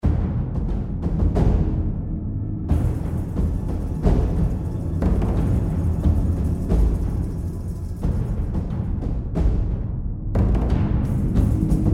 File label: Prebattle; Rising Tides